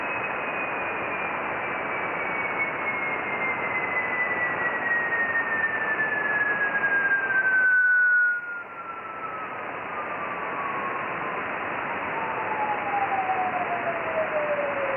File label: iss echo